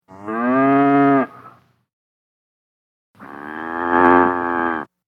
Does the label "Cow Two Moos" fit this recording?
Yes